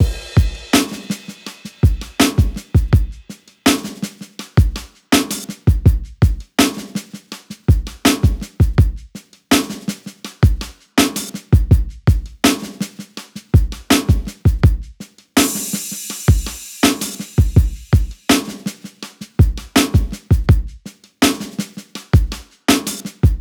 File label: Blues Drums Soul Beat 82